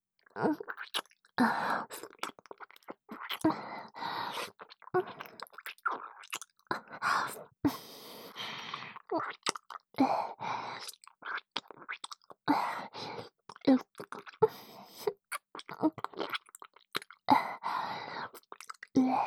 微かな喘ぎ混じり, キス; おまけBGV

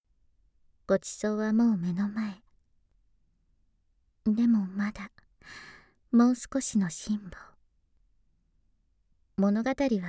フェラ; 本編5セッティング

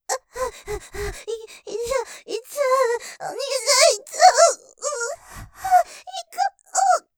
喘ぎ 吐息マシマシで イッちゃう！！; ステレオ

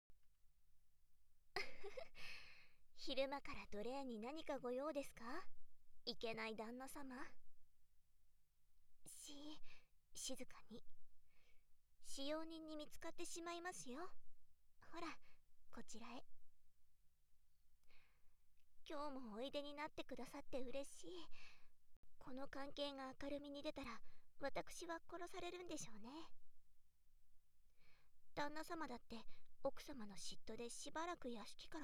発情喘ぎ

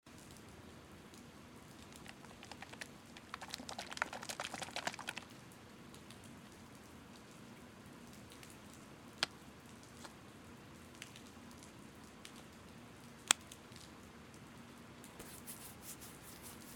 シンプル耳かき六月十五分雨音中

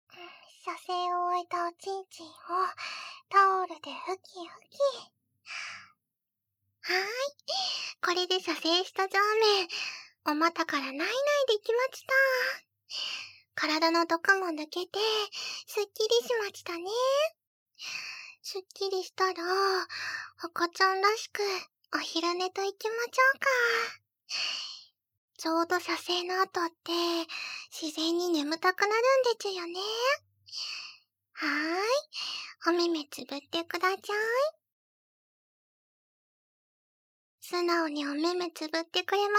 ばぶばぶ耳舐め・子守歌; エマちゃんのばぶばぶショー; 魔界Quest

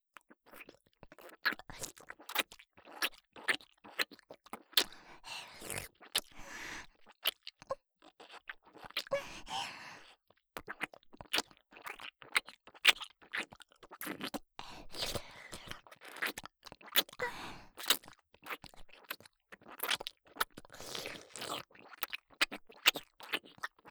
フェラ音

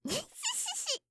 にっしっしっしっ, 笑い声

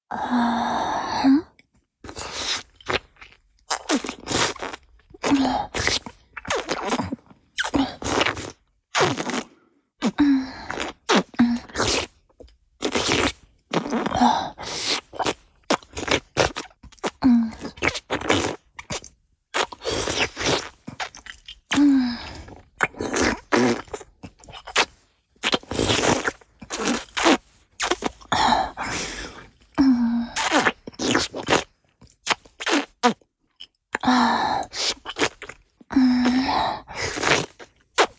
フェラチオ(中):亀頭をしゃぶる, チュパ音; チュパ音抜粋集

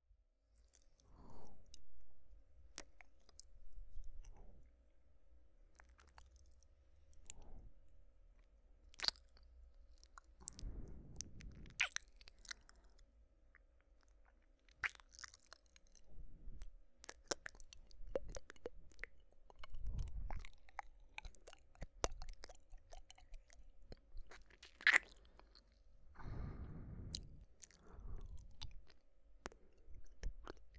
耳はむ×耳キス×耳ぺろ; 癒しの眠れるver

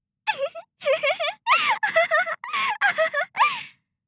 いひっふふふ

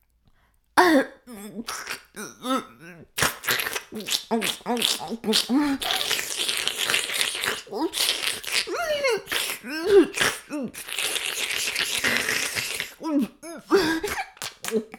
フェラ音声; 白くて黒い魔性円環

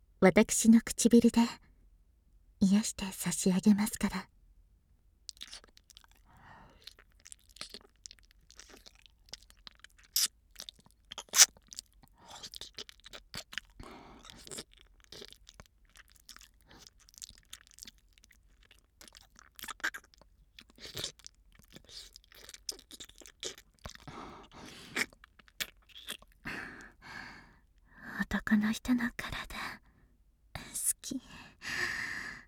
傷舐め

